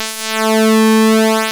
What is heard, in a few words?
PULSE MOD